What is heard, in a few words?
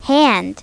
HAND; My Talking Dictionary